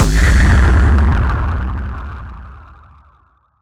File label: Impact; impact samples